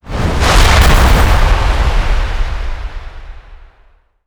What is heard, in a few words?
boom